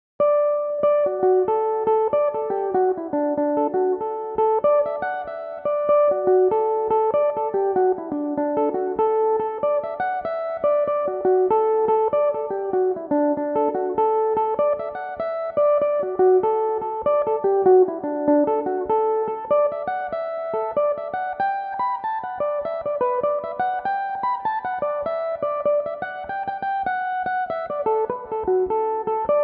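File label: ahascragh pig D major, irish mandolin; Belmuse EM-190 electric mandolin; The Ahascragh Pig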